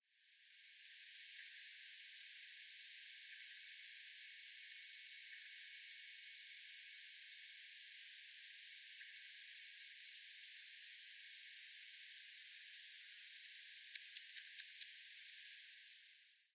Okanagana rubrovenosa rubrovenosa, cicadas songs; US

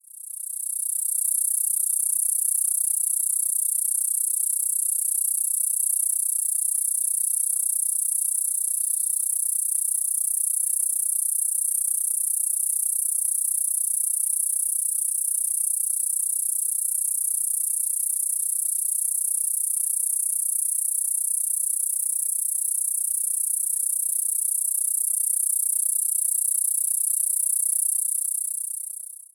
Okanagana bella, cicadas songs; US; filtered

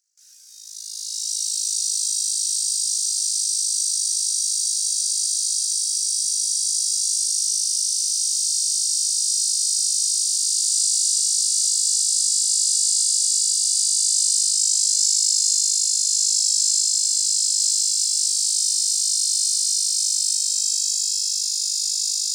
Hadoa chiricahua, cicadas songs; US; AZ

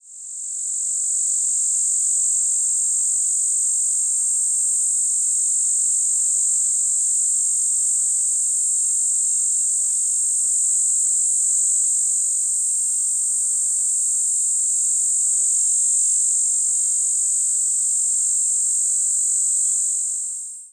Hadoa inaudita, cicadas, filtered; US

